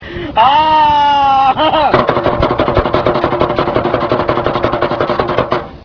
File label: wail